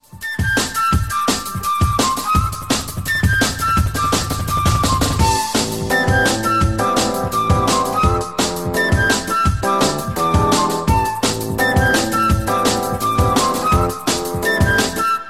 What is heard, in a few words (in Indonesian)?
nada dering samsung